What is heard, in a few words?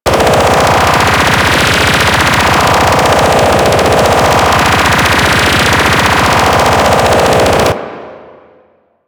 Charge Gun Beam Loop; Crp Charge Gun